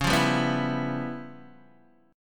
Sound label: Db 7th Sharp 9th Flat 5th Db down Guitar Open D